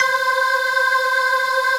Synths Root C

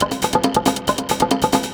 drm 138, Techno, drum-loops; Techno ID; tribal-meeting

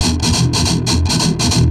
Riff, Industrial; Nasty

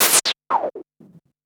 Gated Noise 120BPM; creative gate; One Shots; musicradar

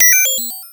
MOOG HAT; AMG - Vince Clarke Lucky Bastard

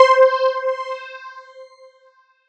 SY PHASOR; ModelCycles

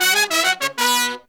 Phantom Horns, 96BPM; 90 s Sample CDs; Zero-G

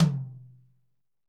tom, Pop Country; Northstar; Drumscapes Roland

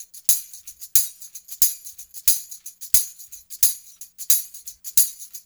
TAMB; East West; Funky Ass Loops

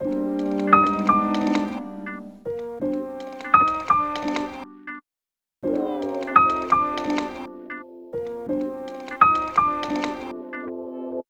Melody, Hip-Hop

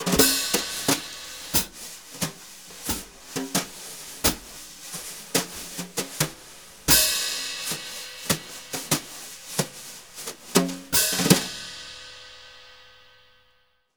BRU, BRUSH; Univers Sons; Jazzistic

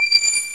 Spiral Snail loop; SNES; Darius Force (Super Nova)

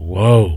"WHOA", HipHop Vox

